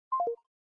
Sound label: galaxy watch call disconnected